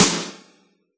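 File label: snare drum electronic, Yamaha DJX Rock Kit; 144 Rock Kit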